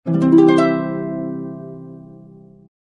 harp low arpeggiated chord

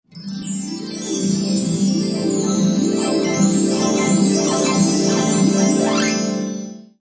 Harp Alert

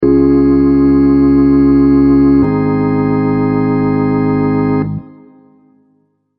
Organ Amen Alert

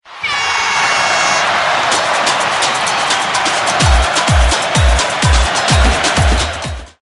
brazillian goal rhythm

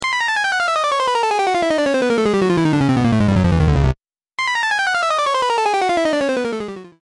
quick descending

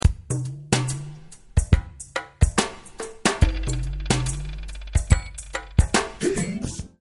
easy going and relaxed

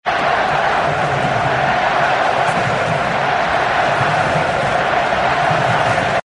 soccer crowd chanting and cheering

